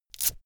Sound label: velcro open cap fast